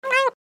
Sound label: Distressed cat meow chirp; animal; gfxsounds